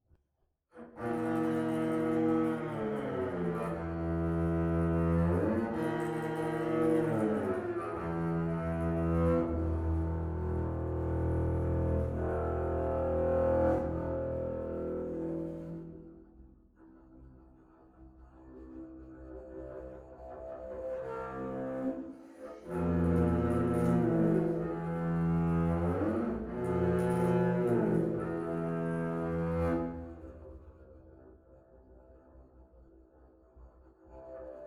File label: Prepared Double Bass